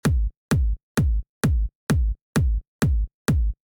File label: kick